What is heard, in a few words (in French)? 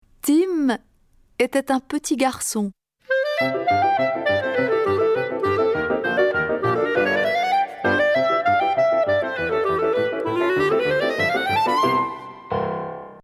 clarinette